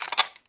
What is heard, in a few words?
clip in; weapons